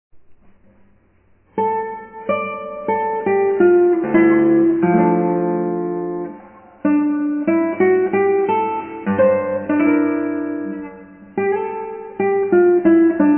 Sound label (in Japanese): ギター